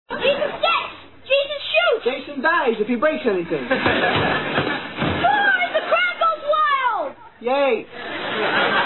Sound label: crowd goes wild